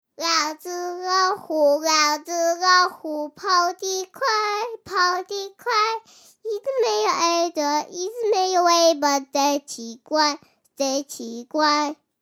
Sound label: china song